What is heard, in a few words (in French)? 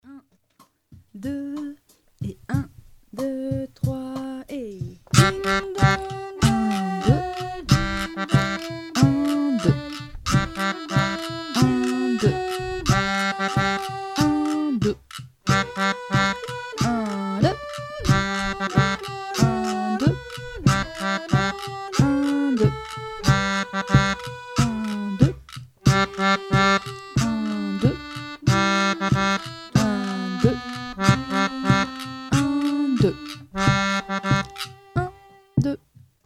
ding dong bell ostinato; piste temoin; debutant